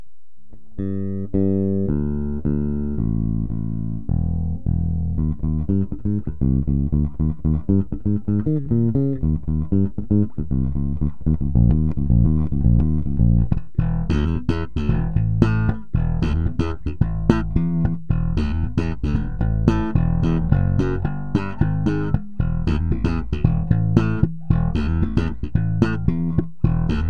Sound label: Ernie Ball Super Slinky